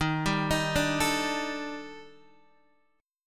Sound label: D sharp Major Sharp 11th, Guitar, DADGAD; D#M#11; arpeggiated